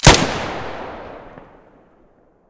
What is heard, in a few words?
muzzle stereo, weapons